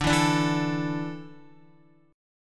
D Suspended 2nd D, down, Guitar; strummed

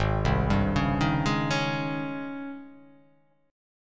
G sharp Major 11th G sharp; JGuitar

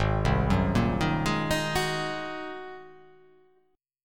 Ab 9th Ab down; Ab9 arpeggiated; JGuitar

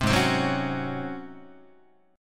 G sharp 11th G sharp, down, Guitar; strummed; JGuitar

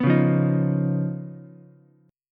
Db 9th Db down Ukulele C6; strummed; JGuitar

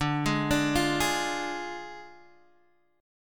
D Diminished Guitar Open D, chord

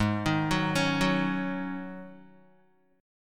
G sharp 7th Suspended 4th G sharp, Guitar; Listen to G#7sus4 arpeggiated

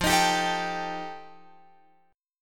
F 9th F Guitar Open F, chord; strummed

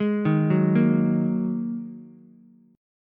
G sharp 7th Suspended 2nd G sharp, down, Ukulele; arpeggiated; chord

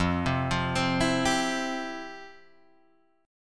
F Major 7th Suspended 2nd, Guitar, Drop D; down; chord shape

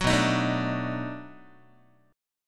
B 7th Sharp 9th, down, Guitar; strummed

